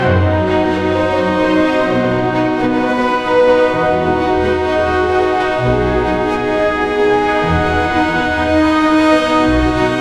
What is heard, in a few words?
Trimphant Orchestral Music; audiogen